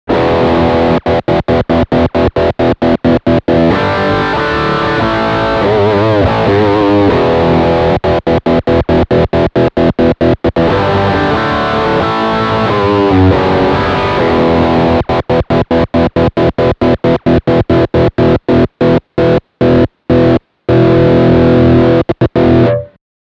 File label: Killswitch Action